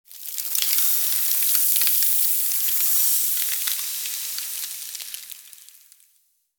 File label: Beverage ice freeze commercials ads tv; Film and TV